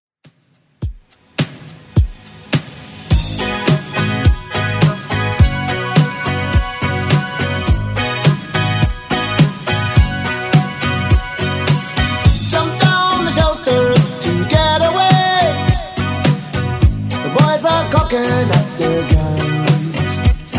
80s, 105 bpm; Redrum

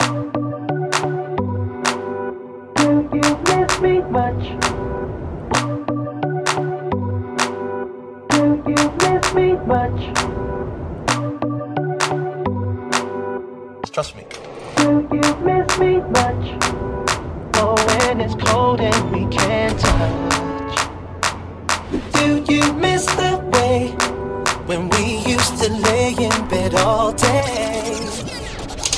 Re Drum 130 BPM; CLAPELLA STARTER

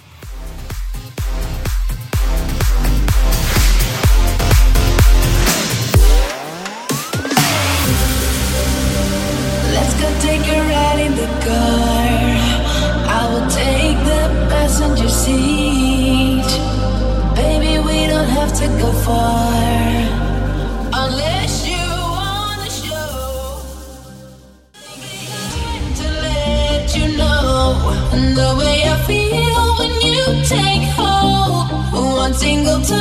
Big Horn Bootleg 126

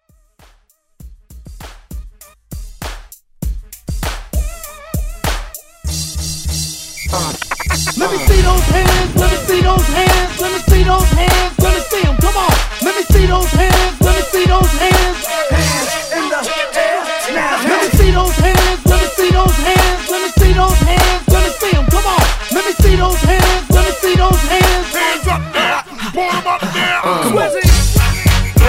Moombah; OG To Moombah

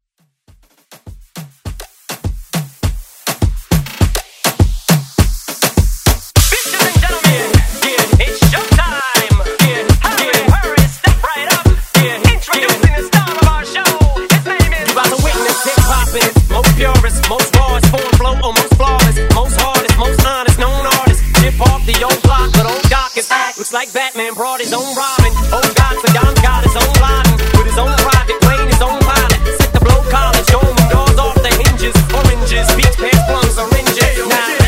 Moombah Redrum

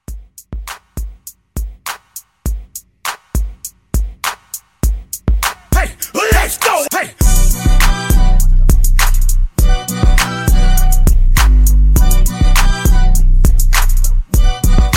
Re Drum 101

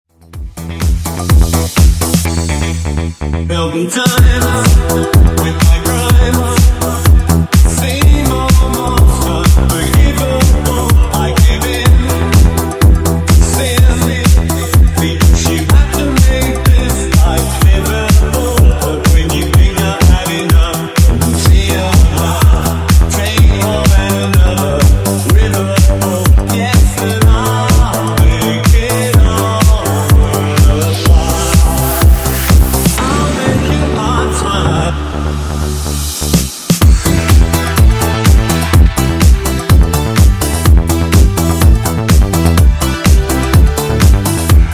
Funk 125 Bpm

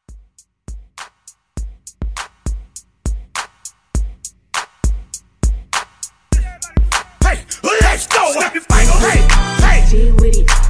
Hype Re Drum 101

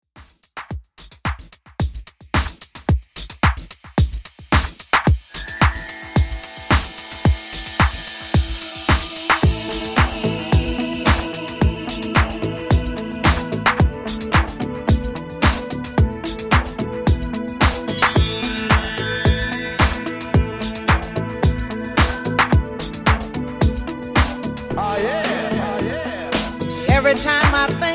90s Redrum 110 Bpm Clean

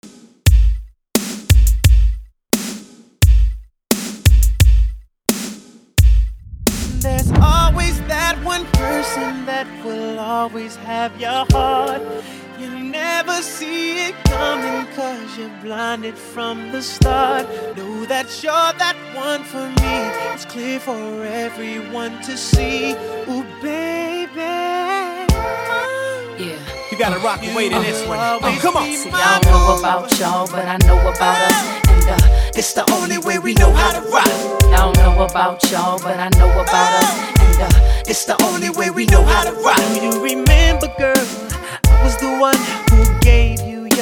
RNB; remixplanet

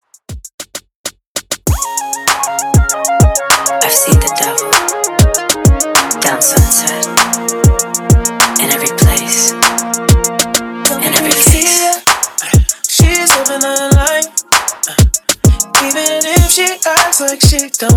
Re Drum Top40 Intro Outro 98 BPM

